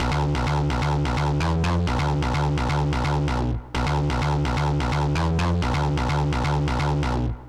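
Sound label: 128 Pop Synthbass Bb minor; Digidesign; Pro Tools; Pro Tools Essential Loops; Pop Rock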